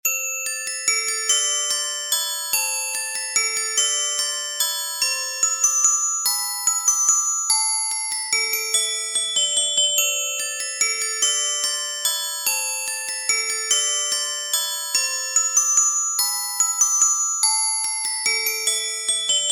nokia x6 tone ringtone

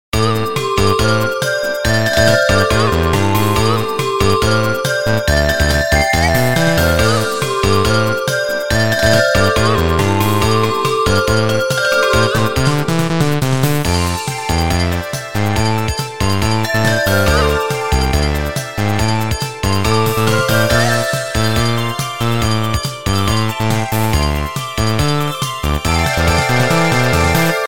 nokia ringtone

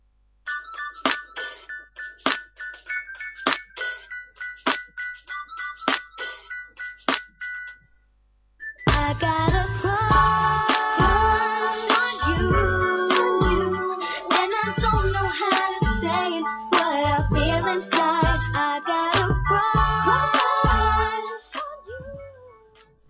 nokia 6700 ringtone

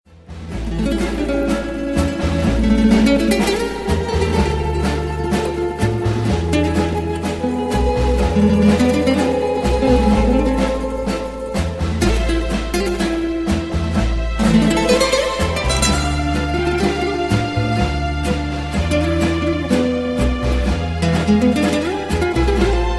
dance tango ringtone

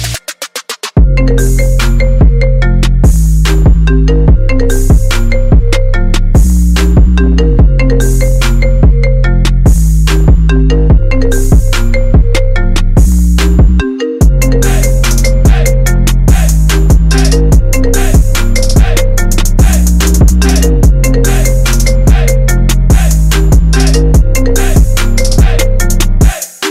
iphone remix trap ringtone

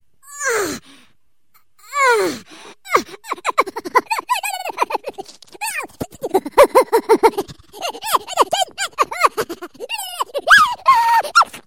funny hahaha ringtone

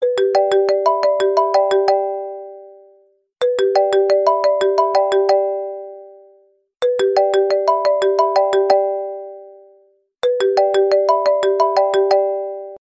iphone ring ringtone

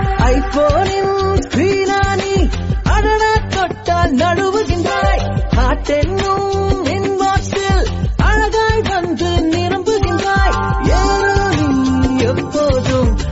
iphone hot ringtone; iphone 8 plus

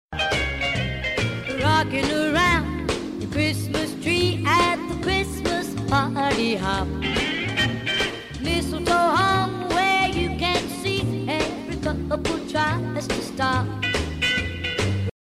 christmas tree ringtone

